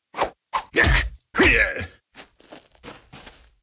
Berserker Spattack

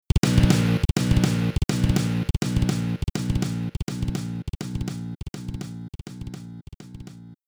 Round Over; BloodStorm; arcade

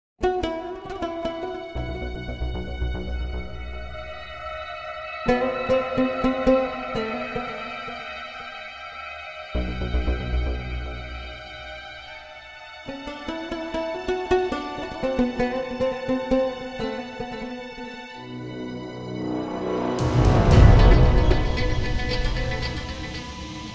Intro Signal

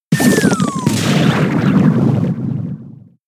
Acid Spray; Pokemon; gen 6; XY; ORAS

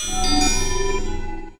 SPELLS Convert; Puzzle Chronicles; PS3; PSP; X360

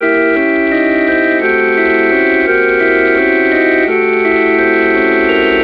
organ; Left 4 Dead 2

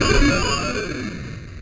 Retry Jingle; Friday Night Funkin' Vs. Sonic.exe

